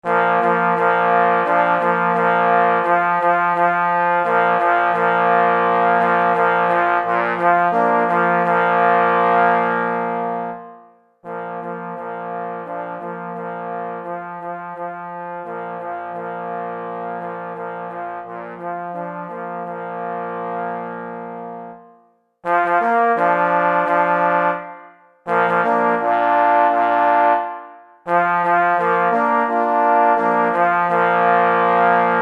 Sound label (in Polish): na 3 parforsy; Cz. Trybus; Hej na byka